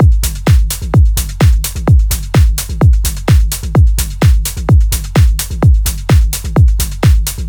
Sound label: Drum Loop Full 128, Melodic Techno; Unleash